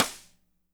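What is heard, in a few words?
medium snare, natural kit; Spectrasonics; Burning Grooves CD2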